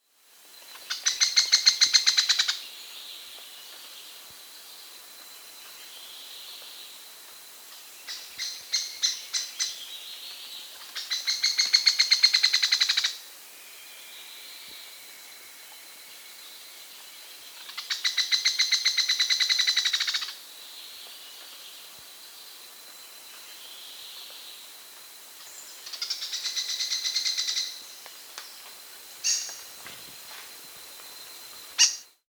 Buff-Browed Foliage-Gleaner (Syndactyla Rufosuperciliata), Andean Birds; S. America